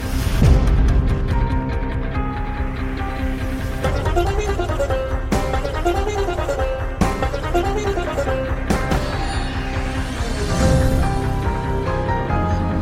Music Ringtone; Family Man

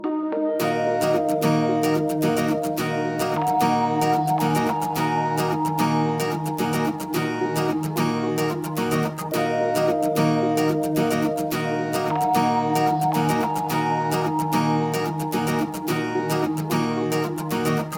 Instrumental

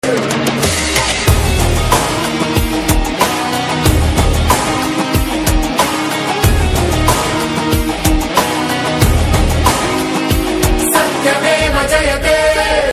BGM Humming Ringtone; Vakeel Saab

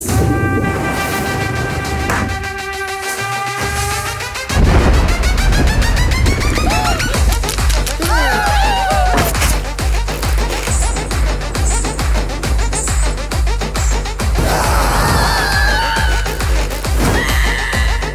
Trailer BGM Ringtone; Laxmmi Bomb

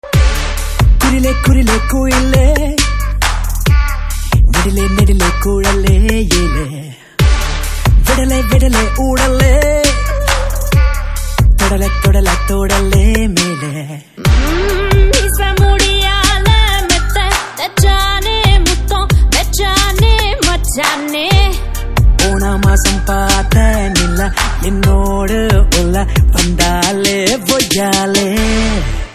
Ringtone

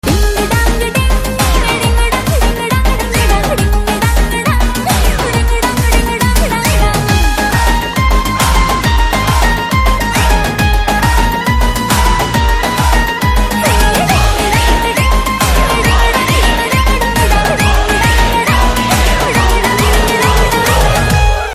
Ding Dong Ding Ringtone

